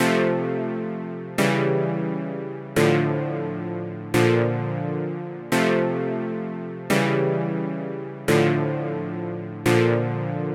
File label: fx doubler; plugins